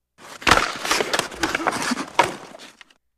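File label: Hockey Player Fight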